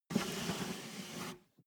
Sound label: step barefoot metal slide long